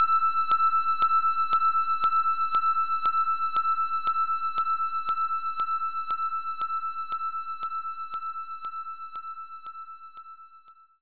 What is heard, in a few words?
Synth Bell; knights try